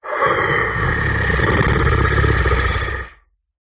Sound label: horror male zombie monster or creature soft warning growl; zapsplat